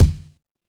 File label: impact punch